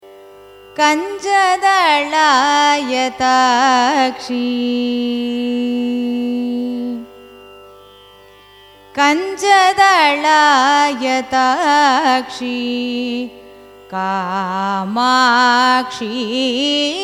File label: raga kamala manohari carnatic; kanjadalayatakshi